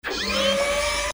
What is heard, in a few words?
servo noise